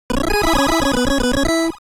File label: Logo Theme